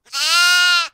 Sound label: bah; Devour 2021 gamerip pc macos